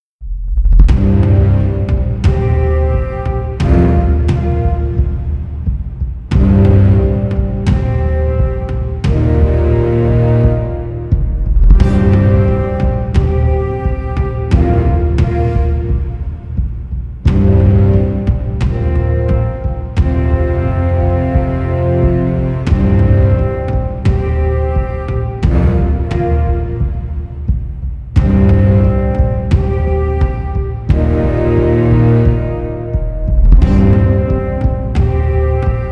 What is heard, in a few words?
Tension Epic Hard; Real Warfare 2 Northern Crusades